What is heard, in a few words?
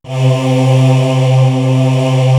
SUPER VX, 01W CHURCH; East West; Global Sounds